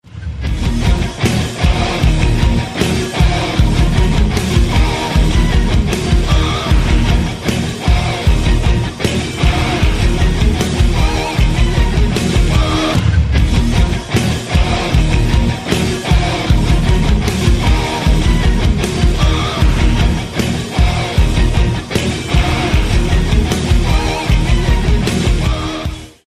Hard Electric Music

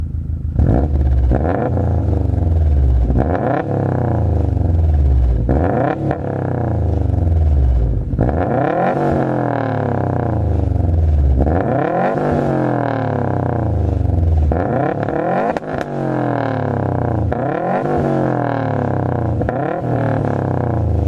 subaru rev

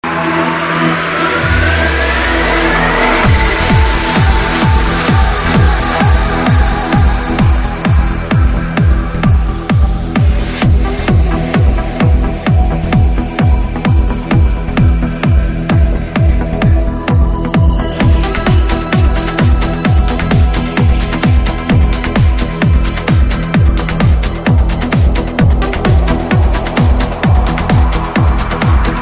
nice progressive, ringtones, electronic

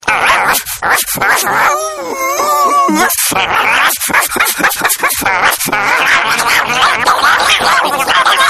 crazy dog, animals

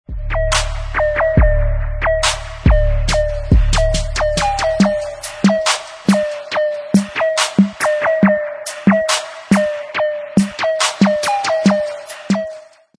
instrumental

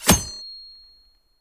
Dagger Strike

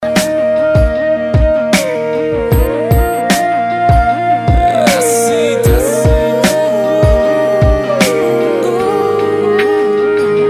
calm sound

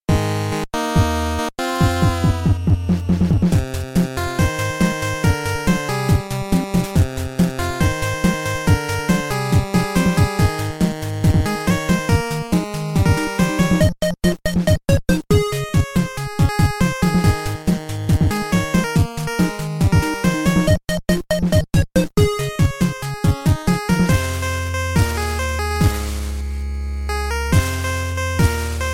Theme 8 bit

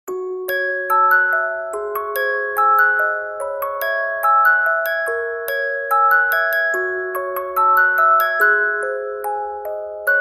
Music Box

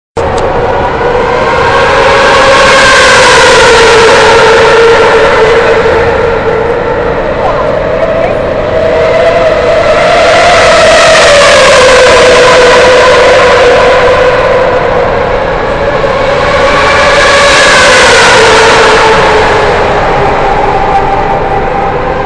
Sound of formula

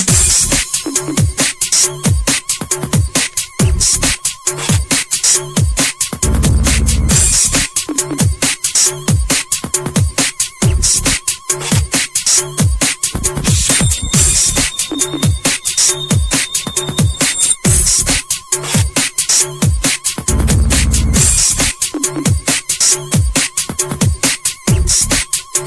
Breakbeat

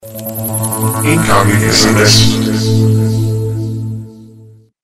incoming message sms; ringtones

